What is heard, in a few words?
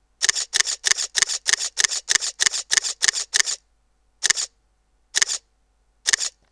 Olympus E-410 continous; JPEG